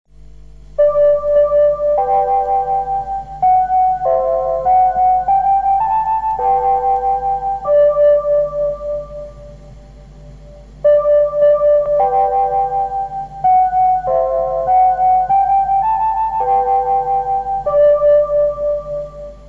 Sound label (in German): Radio Moskau Stationszeichen; RUSSLAND